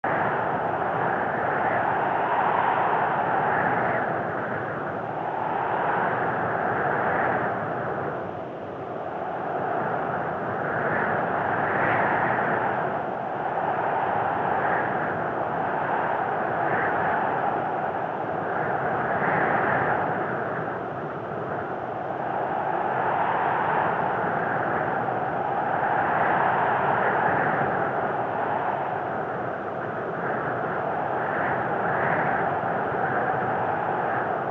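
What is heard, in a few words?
WIND Breeze High Altitude loop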